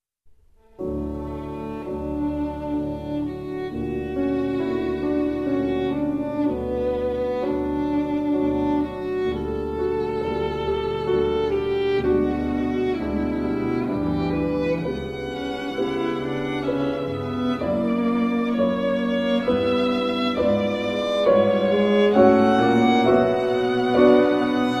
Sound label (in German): klaviertrio